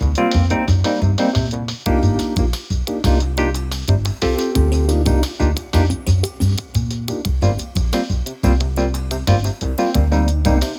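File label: Music Draw Loop; Pictionary; NDS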